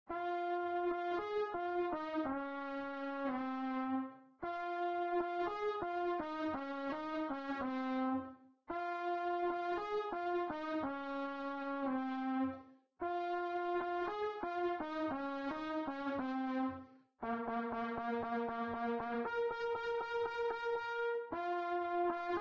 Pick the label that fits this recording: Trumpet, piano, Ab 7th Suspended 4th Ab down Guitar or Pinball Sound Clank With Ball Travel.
Trumpet